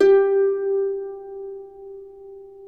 G3 CELTIC, HARP

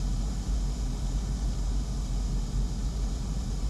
Ambience Loop, outdoor

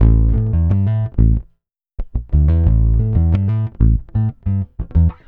Funk 124, Bass; Sonic Foundry (Sony Creative Software); Bass X

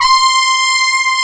MIX BRS C 5, FUNK

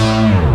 technosyn2, Techno Trance; Ueberschall; SYNTH

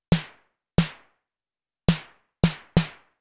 Anabeat Snare; Sonic Foundry (Sony Creative Software); Prototechno

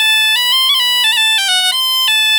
AM BPB, Celtic Flavours, BAGPIP090; USB Soundscan vol.42; AKAI

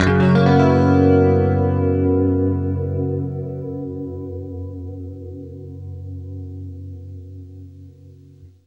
FLESH CHORDS, Guitar

